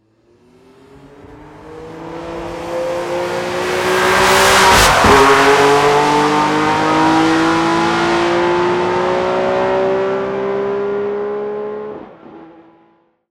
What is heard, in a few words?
mercedes amg one driveby